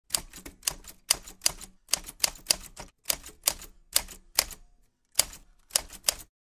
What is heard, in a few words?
Smith Corona 1940 vintage typewriter typing